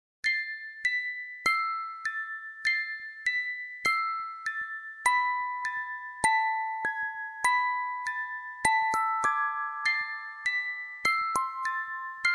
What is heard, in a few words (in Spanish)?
caja musica bailarina; Expediente Triana; Bailarina en el túnel